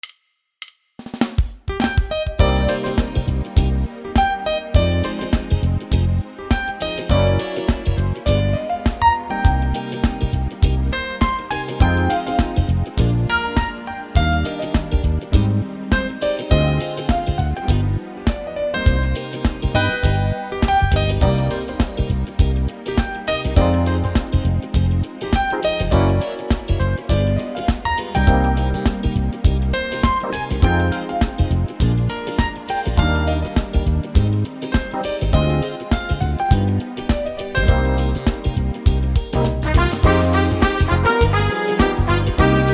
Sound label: Piano Brass Jazz Pop Yamaha; Wersi